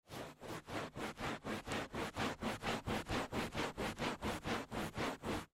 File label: Carpet Clean Scrub, Carpet Clean Scrub Towel; Cleaning; Household; Blastwave